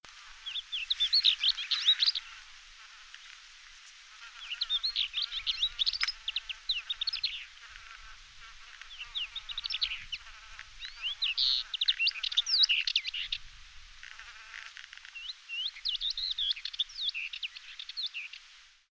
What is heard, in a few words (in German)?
theklalerche